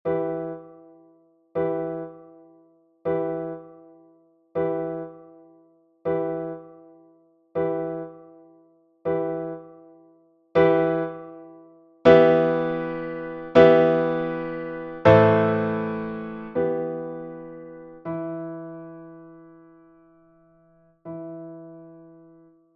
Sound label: carol of the bells